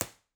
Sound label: uncorrected Impulse Response Measured